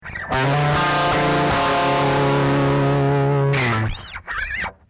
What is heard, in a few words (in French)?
disto riff; riff son disto sans reverb